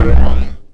health, items

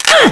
fall; vortex; unit01

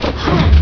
jump; vortex; terminator

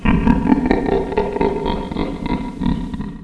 chuckle; lmctf

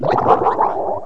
DROWN; Zumlin

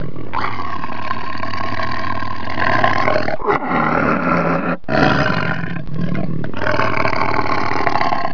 tiger unhappy; baseq2

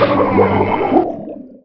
DROWN; DarthVader

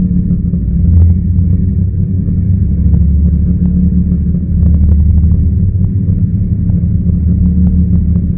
erie hum

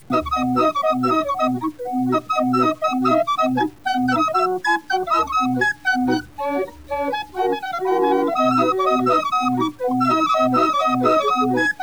rock

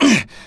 fall; drfaustus

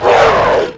medic pain

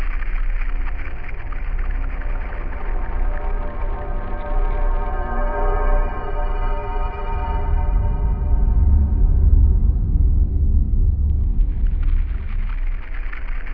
mist ambience; spawngirlsounds

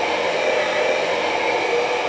turbine

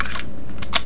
load lever; gunslinger

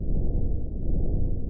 fan

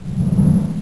jump; openffa; hiro

